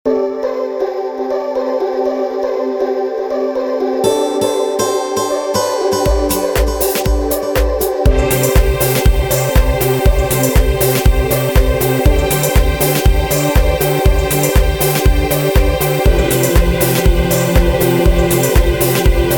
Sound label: Happy Uplifting Ringtone